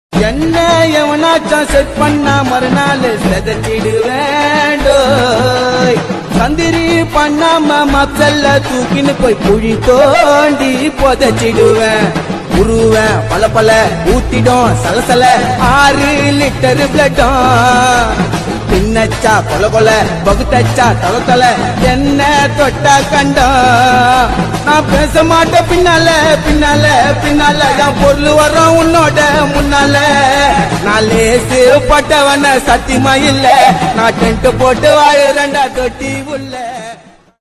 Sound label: Song Tamil Ringtone